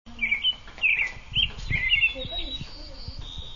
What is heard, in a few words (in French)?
nature oiseaux